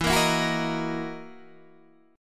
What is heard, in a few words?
F sharp 13th E